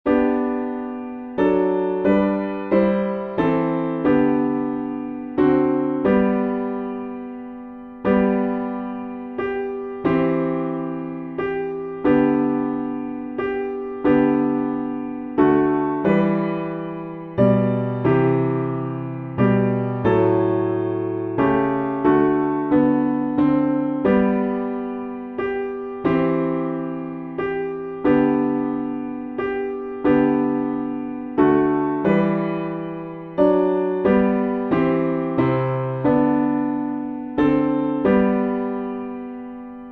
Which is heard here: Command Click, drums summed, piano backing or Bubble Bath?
piano backing